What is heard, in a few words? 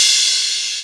cymbal; E-MU Formula 4000 Series Vol. 1; Hip Hop Nation; Bonus Perk